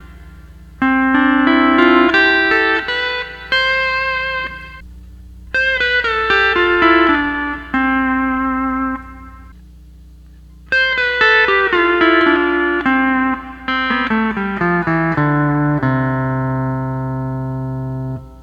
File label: 12 String Lap